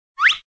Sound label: sharp wheep